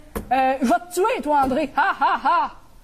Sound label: ahahah